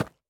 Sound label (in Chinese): 山羊脚步声